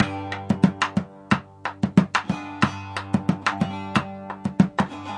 percussion saz loop

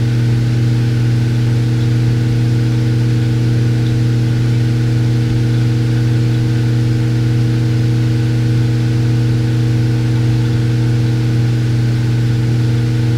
Low Drone; Cumbria Remixed Sample Pack

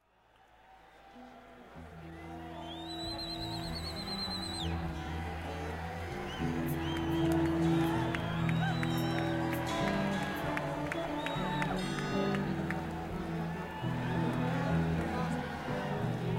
Crowd

